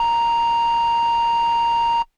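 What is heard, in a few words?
Air Bass Kawaii A#1; keys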